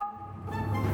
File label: Music Wait Theme Bpm128 Start; asterix obelix slap them all 2